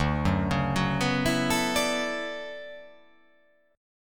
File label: D Major 13th D down